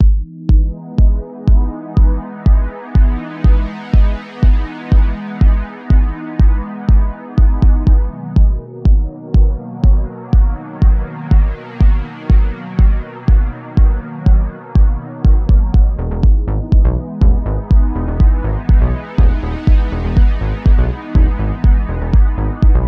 Positive and Happy Deep Techno House for Background